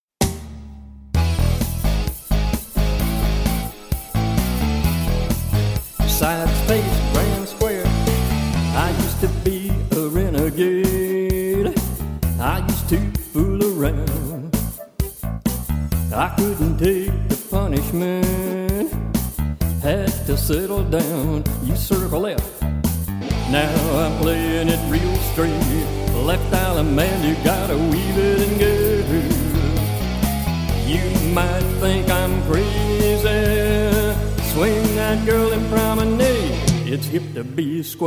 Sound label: vocal, square dance